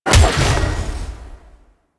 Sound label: Melee Jump Slam Impact, Whooshes; Weapons; Warframe